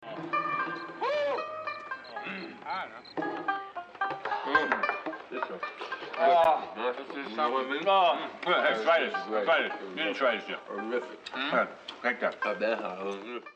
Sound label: koto talk